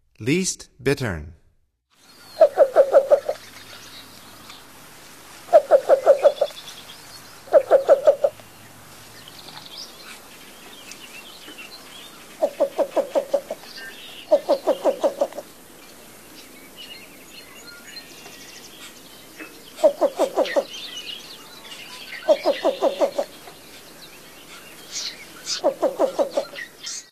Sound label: Least Bittern; North America; Stokes